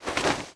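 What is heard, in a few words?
attack staff cloth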